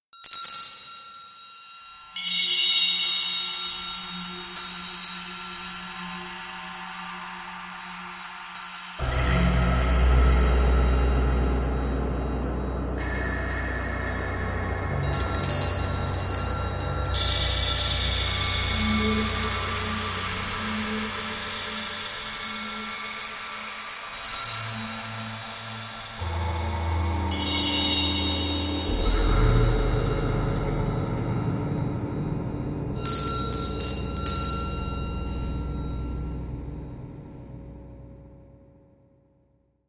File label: Bell